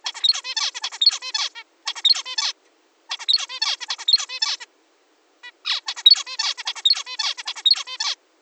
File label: example of juvenile-directed song